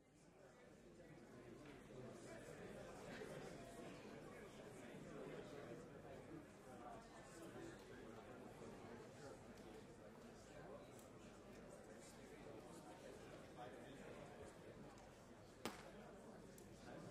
Black film stock is repeatedly cut and rejoined. The cuts are made with the angled blade of a splicer; Black film stock is repeatedly cut and rejoined. The cuts are made with the angled blade of a splicer normally used for joining sound film.; Guy Sherwin; Arika